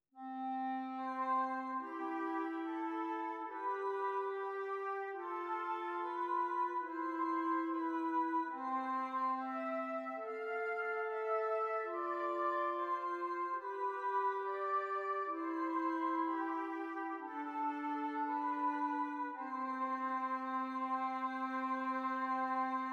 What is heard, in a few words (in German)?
Zwei simmiger Contrapunkt; Ronge